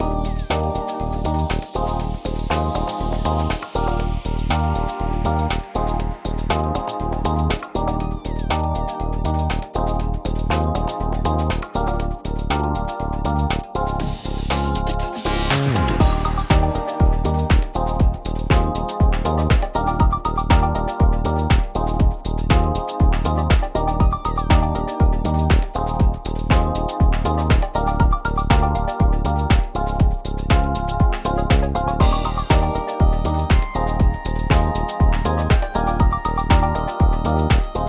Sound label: BGM menu; Tousou Chuu The Great Mission